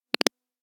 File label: crackling; game sounds